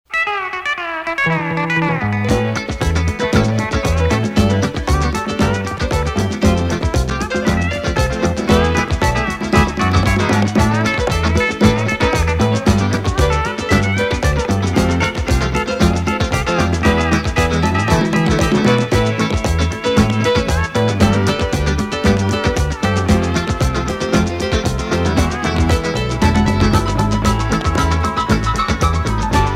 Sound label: Crossover Long Instrumental; Tanner